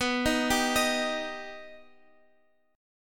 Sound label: Eb Augmented B